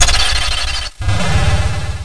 door; mazeofgalious

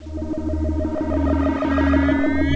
teleporter backwards; opensurge

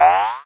goal; lix

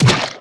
bat hit; lix

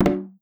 Toxic Transfer Start

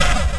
demon bullet; mazeofgalious

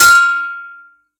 overtime; lix

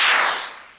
water in; opensurge

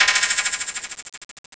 end sign; opensurge

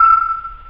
Submarine; gnustep

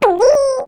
goal bad; lix

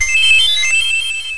disappear; mazeofgalious

